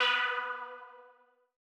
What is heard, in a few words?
Lead B3; KG MegaSaur